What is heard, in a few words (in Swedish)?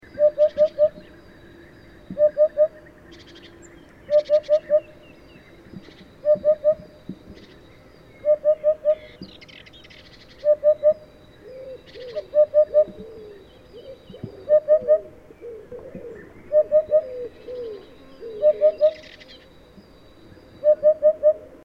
Harfagel